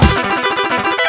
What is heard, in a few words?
End Secret Found; Doom